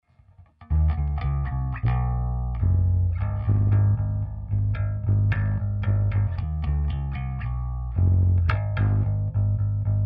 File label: low bass